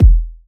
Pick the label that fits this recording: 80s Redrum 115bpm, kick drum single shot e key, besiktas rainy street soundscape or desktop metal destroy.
kick drum single shot e key